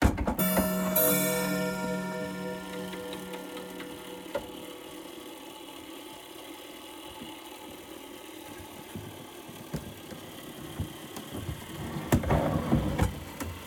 Wall Clock Handling Gearwheel Spinning; Stereo; Justsoundeffects; Clocks and Mechanics

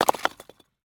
brick impact debris; Foley Elements Rocks, Dirt And Stone; Soundrangers

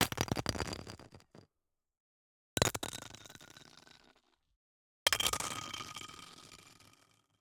Icicle Sliding on Ice; Soundopolis; Icy Snow 2 HD

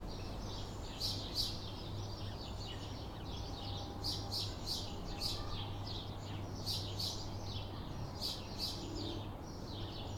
TOWN Street Calm Afternoon Sparrows Traffic; Systematic-Sound; General Ambience Series; Towns & Villages 01

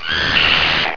headcrab fast attack; hl2

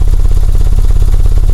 atv idle; hl2

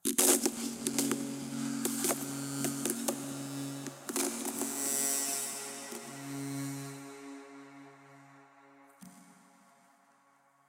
Designed Ice - Melodic cracking texture; EVO23

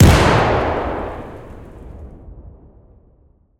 explosion urban; Detonation - Explosion; BluezoneCorp

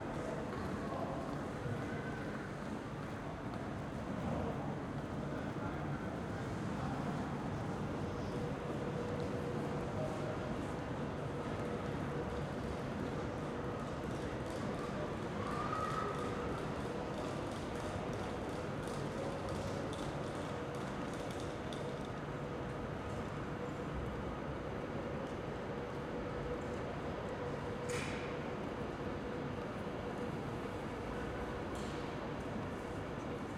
Train Station Ambience; InMotionAudio

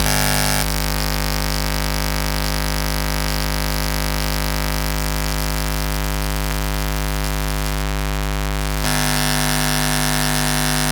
Defect Noise Hum Buzz; Defect – Hum, Noise And Glitches; CB Sound Design

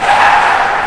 vehicle skid; hl2

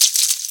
squirm, headcrab poison; hl2

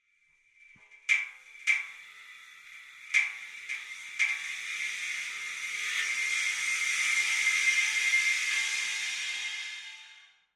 Air Compressor Stress Adjustment Contact; Collected Transients; Machine Emanation

